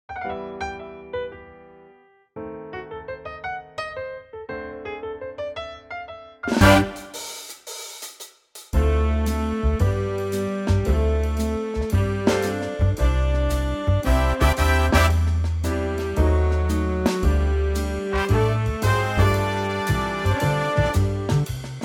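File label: C, backing track; key - C - vocal range - A to C; Corny old music hall singalong gem in a lovely Big Band arrangement.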